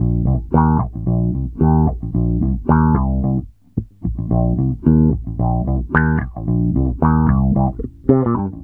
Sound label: SWEEP 111 C, Bass Grooves; USB Soundscan vol.30; AKAI